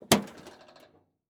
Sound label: Sports Grill Metal Cover Opening